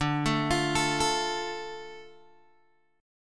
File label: D Minor D Guitar Open G